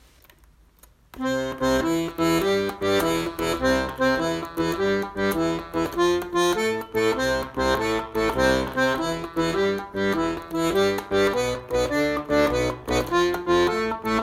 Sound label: blues groove